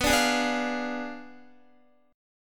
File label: B Diminished 7th B down Guitar; strummed; tuning 4F,4A,5C,5G,5C,6E